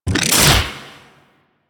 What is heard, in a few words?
Leap Whoosh; Enemies; Abilities; Warframe; Corpus; Arachnoid Hunger